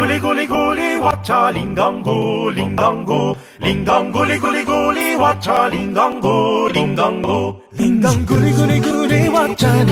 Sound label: chinese singing music meme weird